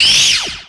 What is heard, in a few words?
sparkles burst; befriend; Earth and Beyond; EA Games